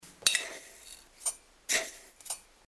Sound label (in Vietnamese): dung muong xuc gia vi duong trang